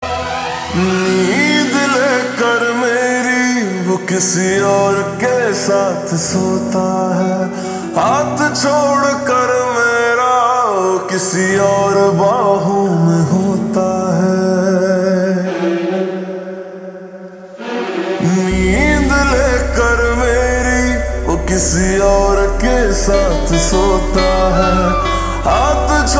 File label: Ringtone Slowed Reverb